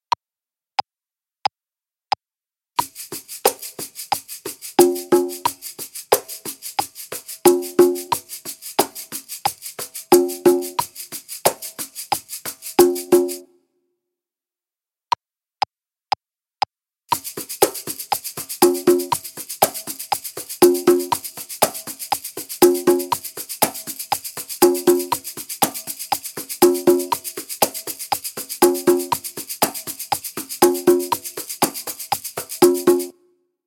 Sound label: Shaker congas